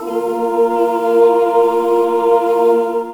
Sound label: Orch voices; awe32